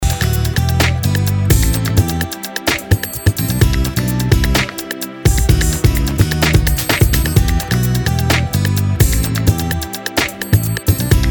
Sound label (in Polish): ale we mnie intrumental